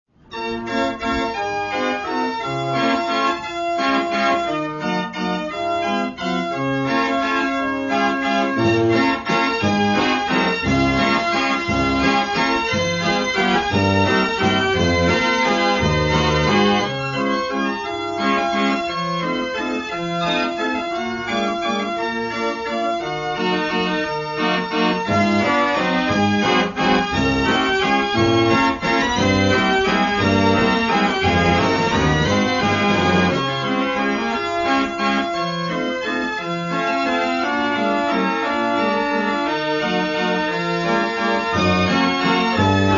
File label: Waltz